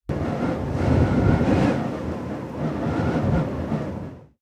wind original

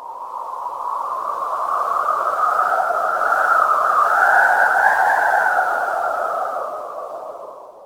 wind

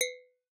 Glass cracked

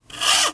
Rubbing Scratching Filet

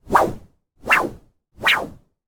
whoosh

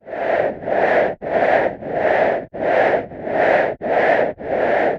synth Arches

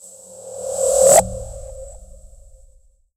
Pass By

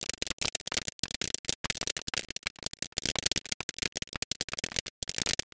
rain drops Leaves

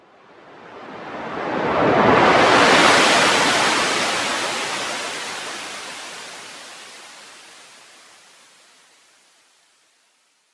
wave

